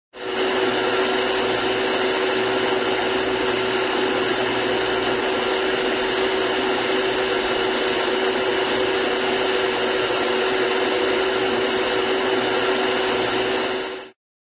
projector, film 35mm running; cartoon

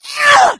rage, infected female; npc; Left 4 Dead 2; Source Engine

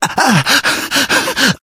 exertion critical, survivor voice, manager

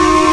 ball homing; source engine; tf; passtime; video games

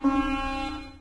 the 60's usa exclamation; windows 9x; sound schemes

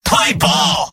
battle cry; video games; source engine; tf; mvm

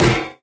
physics turret impact md

